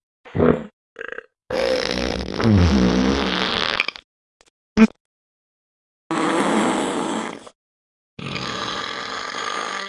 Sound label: toilet; Знатные звуки пердежа